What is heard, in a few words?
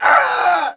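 Settler Male Die Vox; Civ4 warlords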